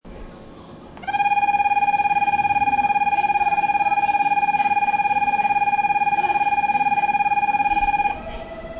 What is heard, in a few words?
osaka bell; jrwest